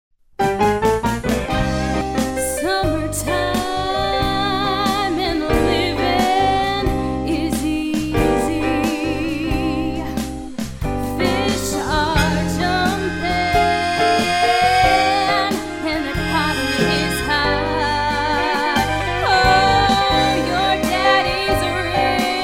Jazz

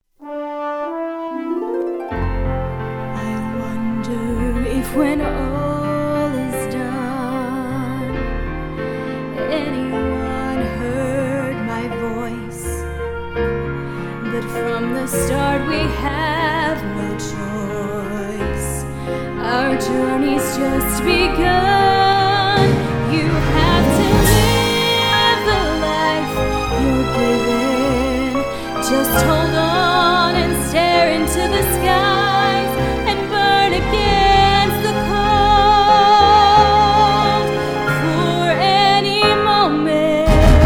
VOCAL

